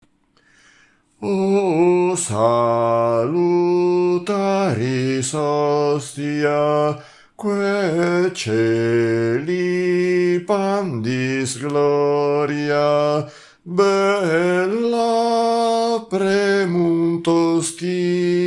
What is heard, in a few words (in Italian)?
bassi; O Salutaris Hostia Perosi